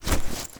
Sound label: Drifter Foley Look At Figurine End; Quest; Ambience; Gameplay; Duviri